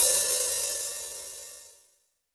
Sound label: HiHat, Roland R-70